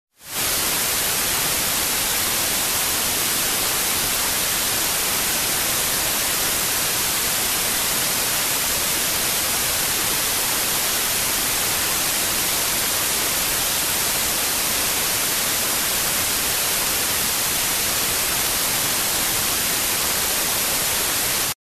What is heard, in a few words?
Steady air flow